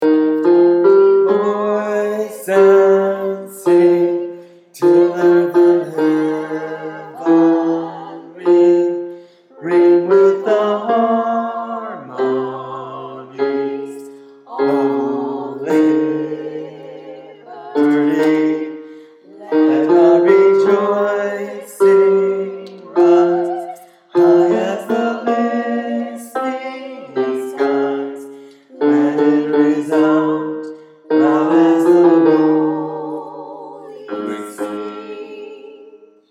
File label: bass lift every voice and sing